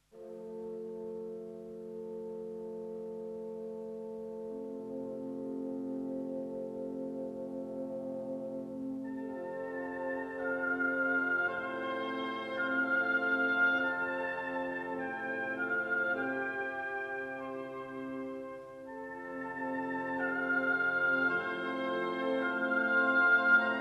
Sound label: Violin Concerto Adagio